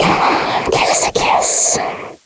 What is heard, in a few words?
Stalker Threaten